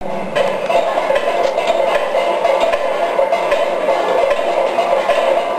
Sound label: drummer